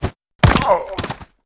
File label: death; quake2; coop; quakeguy